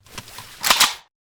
AK Pickup

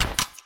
Crossvec Equip Stock

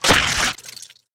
Slash Hit

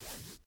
Rev ADS Up

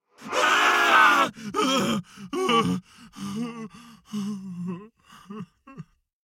Rage to Docile; SCP-096; Enrage

